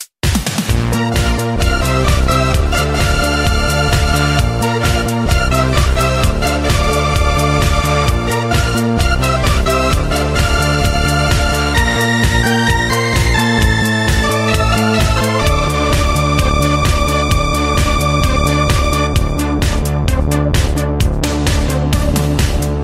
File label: Disco Relax